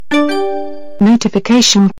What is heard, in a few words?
Ding Notification